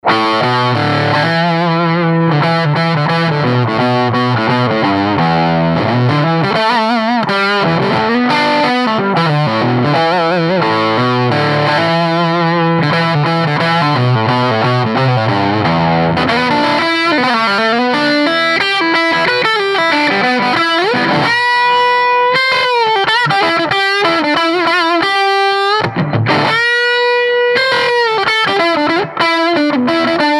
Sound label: razor wire edgy high gain; Gibson 335; 5W Class A - Single-Ended - 6V6 or 6L6 - Tube Rectified; Swart Atom